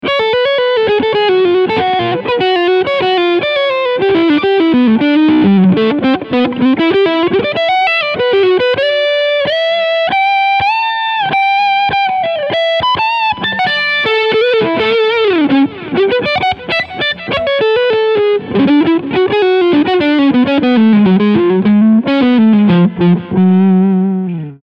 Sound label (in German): Leadsound Front HB